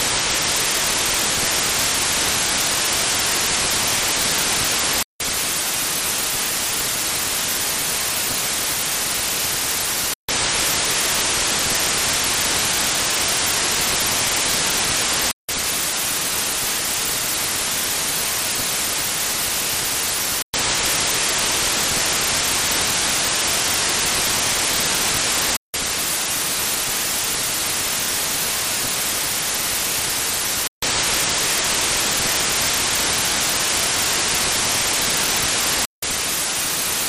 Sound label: noise stereo